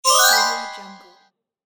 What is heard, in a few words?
egyptian bright win; gamechestaudio